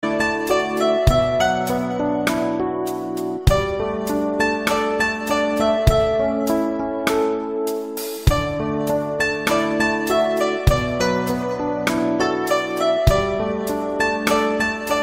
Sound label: bamboo flute